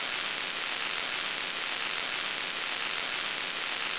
idle, weapons; cso; thunderstorm

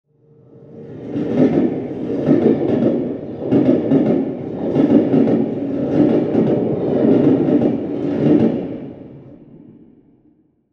distant train, railroad; soundscapes

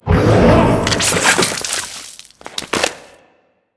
ambient, advisor barn

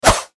swing sword

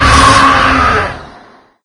attack, lostsoul; doom3

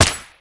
shoot, weapons; dual silencer

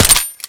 silenced

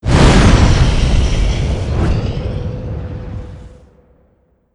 fire; doom3; archvile

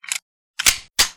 stealth pistol reload

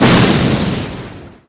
transform whoosh; gwyn

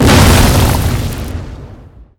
impact ground mid

